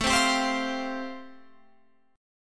A-Minor 7th Sharp 5th, Guitar Open F, chord; Am7#5 strummed; JGuitar

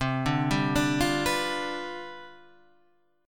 C Major 7th Suspended 2nd Suspended 4th

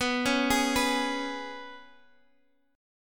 Ab Minor Double Flat 5th B

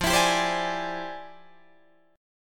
F Minor Major 13th, Guitar, DADGAD

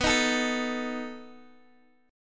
B 7th B down Guitar Open G

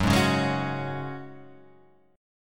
Gb Suspended 2nd, Guitar Half Step Down

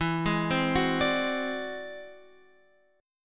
E Augmented 9th E down Guitar Open F; E+9 arpeggiated; JGuitar

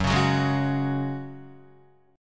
Gb Suspended 2nd, Guitar 4E,4A,5D,5G,5C,6F; Gbsus2 strummed; Gbsus2 chord

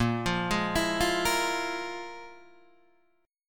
F Minor Major 7th Double Flat 5th

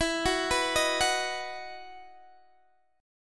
E Major 7th Suspended 2nd, Guitar, DADGAD

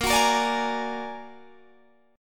A sharp Augmented 7th, Guitar Standard; A#+7 strummed; JGuitar

